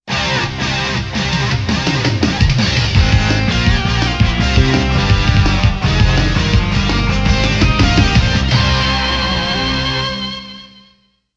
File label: Stage Clear; pulstar the definitive soundtrack 2019